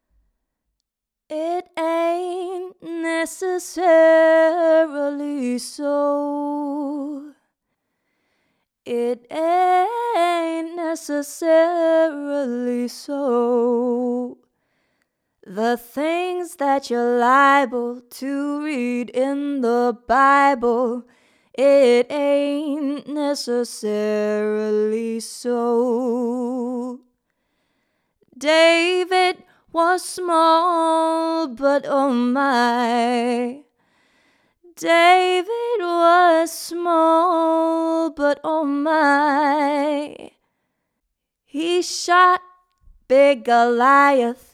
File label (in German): Gesang